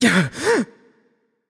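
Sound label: nonverbal breath; voicepack; smite; merlin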